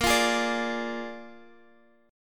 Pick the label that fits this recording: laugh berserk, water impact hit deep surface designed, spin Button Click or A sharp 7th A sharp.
A sharp 7th A sharp